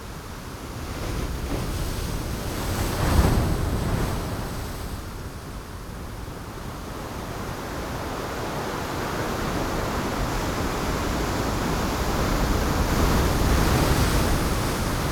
Wave_1, ocean wave sounds

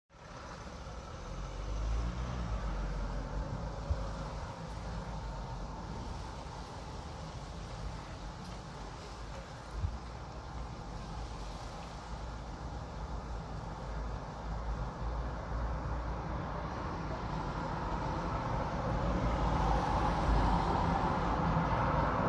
cars window

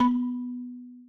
UI Click

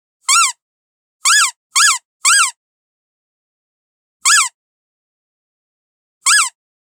Duck Toy

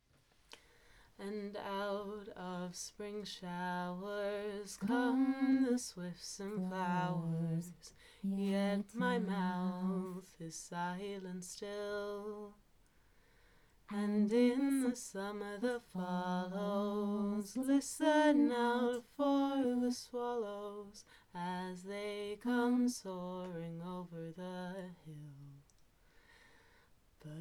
duet summer

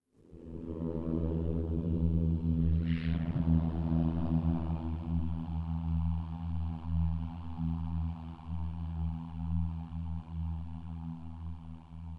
low frequency vibration

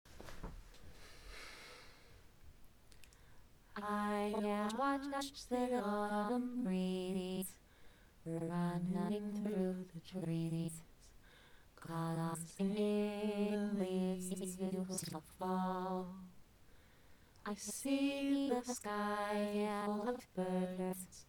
duet

